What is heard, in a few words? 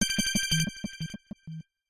Universe bell, ringtones; Samsung Galaxy S24